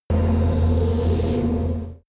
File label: extrinsic air suck in